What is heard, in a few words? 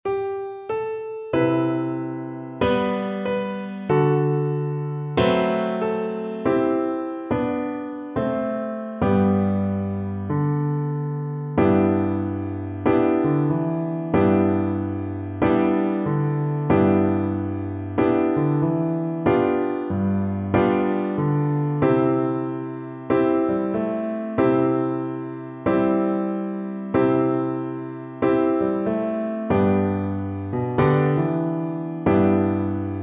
Double Bass